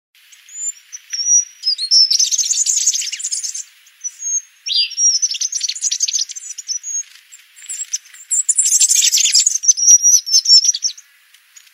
Canto do fura flor azulado

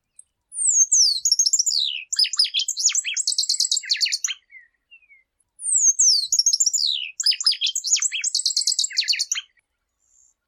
Canto do Chupim

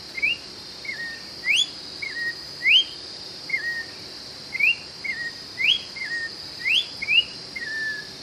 canto do joao pinto

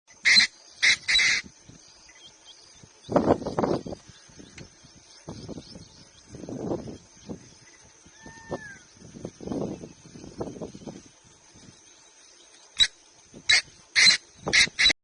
Canto do francolim pintado

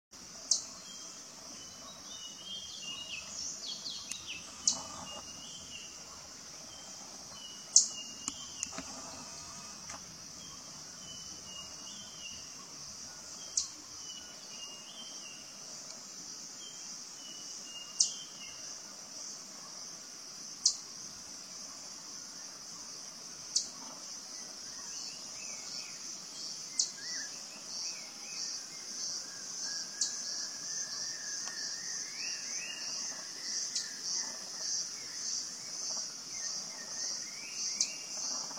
Canto do trepadeira de flanco enferrujado

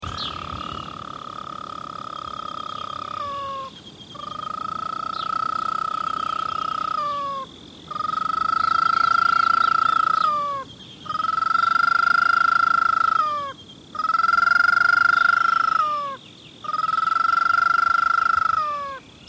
canto da sana carijo

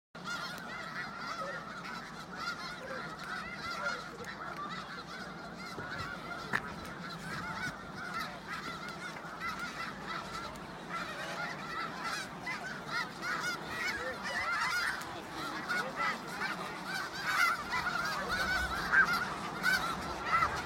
Canto do gansos da neve

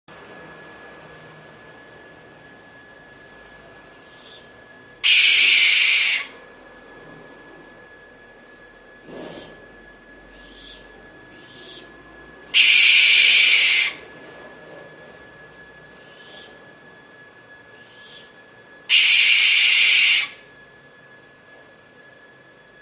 canto da coruja de celeiro

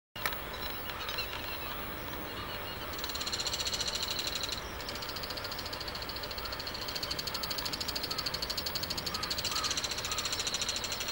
canto do picanco cabeca de touro

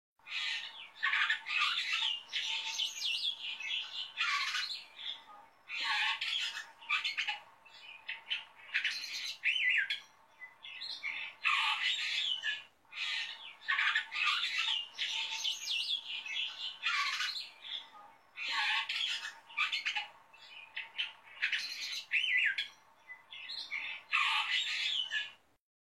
canto do estorninho rosado

